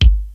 clear kick drum single hit G sharp key

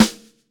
nice acoustic snare A key

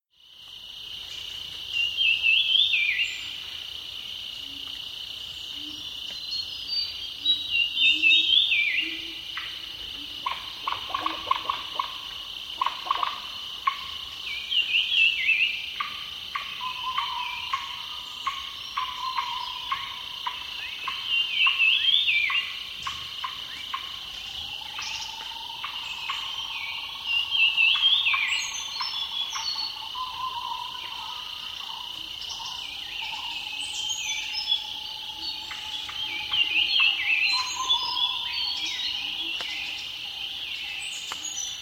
birdsongs in lowland forest

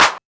short clap sound b key

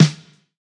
wet snare sound D# key; snare drum samples; one shots

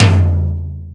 high floor tom sample f key; tom drum samples; one shots

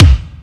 bass drum single shot c key

